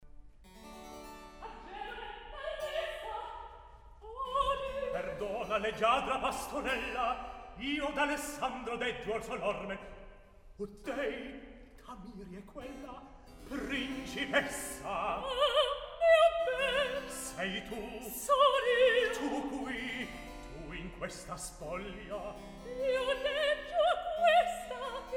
Recitativo; Agenore T'arresta; Mozart; Il Re Pastore

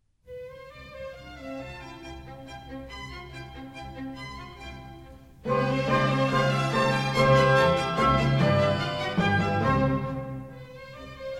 German Dances, C major; Mozart; Dances and Marches

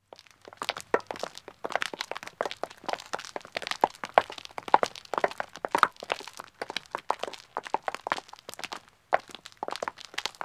Country Road Walking 2 men &, Footsteps; The BBC Sound Effects Library

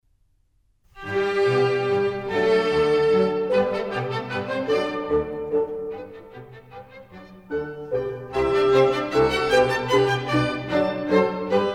Minuets, G major; Mozart; Dances and Marches

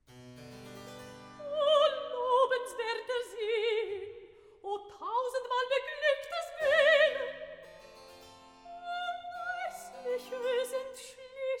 Recitativo; Mozart; Oratorios, Cantatas and Masonic Music; Grabmusik KV42-35a

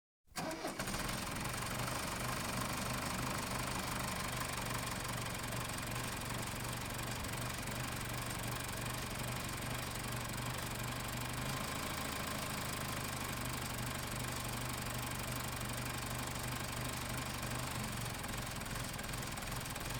Grain Blower, Farm Machinery; The BBC Sound Effects Library

